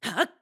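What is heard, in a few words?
Jump Attack, voice; BOTW; Breath of the Wild; Link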